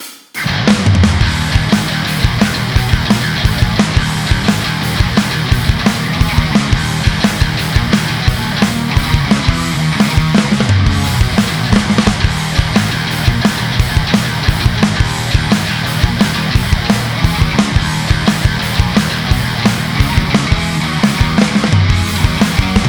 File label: Drums; Free Drums Shootout; Steven Slate Drums